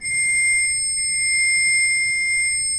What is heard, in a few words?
C6, Cathedral Organ; MIDI-ORGAN; Propeller Island